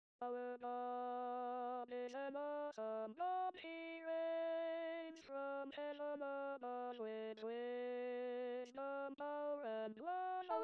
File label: Basses